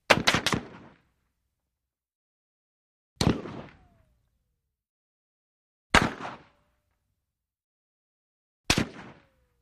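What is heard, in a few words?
AK47 Single Shots Impact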